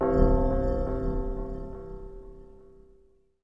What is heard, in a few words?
Ring